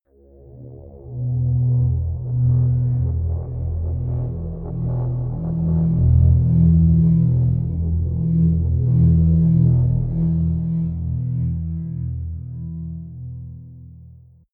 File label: atmospheric feedback pad drone; musikelemente; drones